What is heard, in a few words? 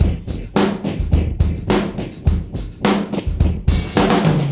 fx, Beat Loops, 106; Zero-G; Monster Beats